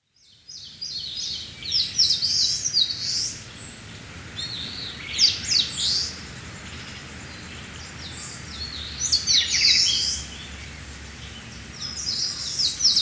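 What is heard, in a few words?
Eastern Crowned Warbler, kokubunji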